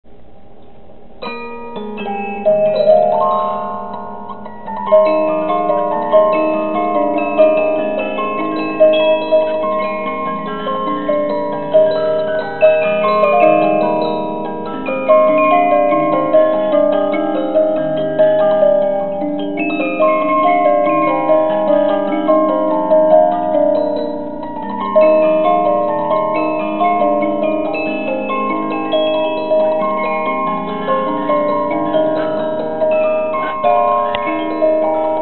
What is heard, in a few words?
musicbox